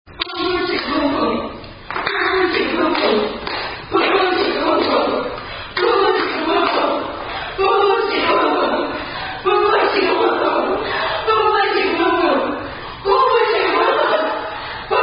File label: Boom Boom Chicka Boom Boom, Girls Singin Unknown Gesture Song